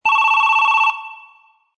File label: Ring 70s; midi